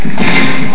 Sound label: buzzin